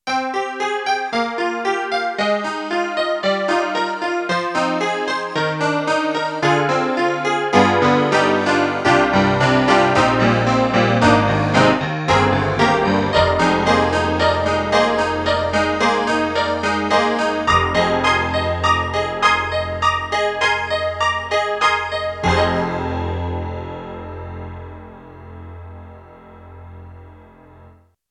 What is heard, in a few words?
E-MU Proteus1, Saloon Piano; PopRock